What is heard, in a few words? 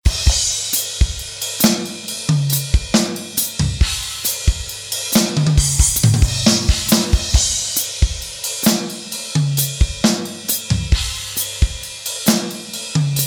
Secret Garden Drum Track